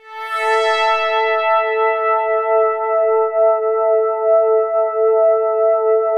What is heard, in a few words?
5th hard A3; SPACIOUS; Music Store - Claudius Diemeter Schwerlos; SPACIOUS 1